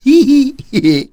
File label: laugh; Cars